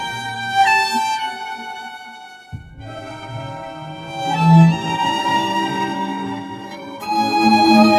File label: romantic